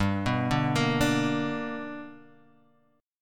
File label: B Minor Major 7th Sharp 5th